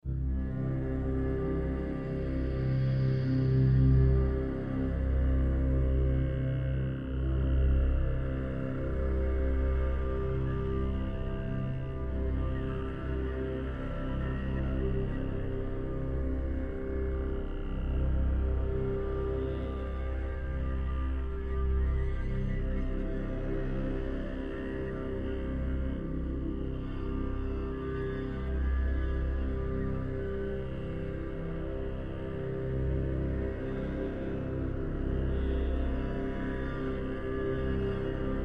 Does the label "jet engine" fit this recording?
No